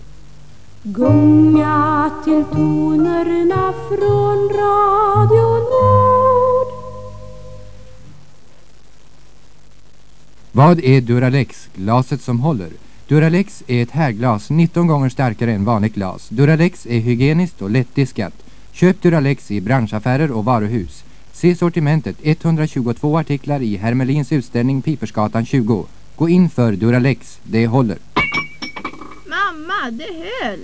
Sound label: jingel